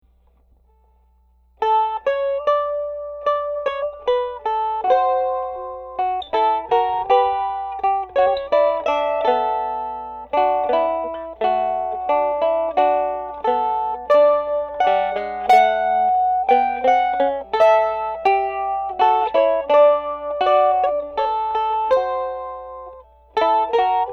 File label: 1st take on electric mandolin